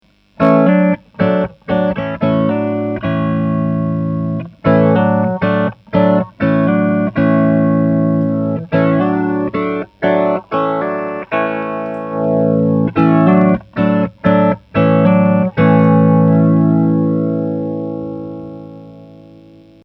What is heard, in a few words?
clean Substr mode Steg HB